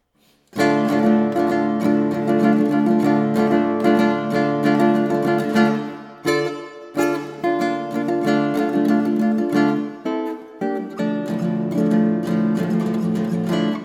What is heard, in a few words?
Rumba, classical guitar